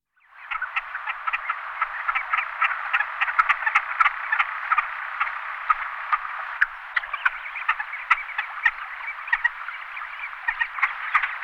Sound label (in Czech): Turpan hnedy Melanitta fusca, nasiptaci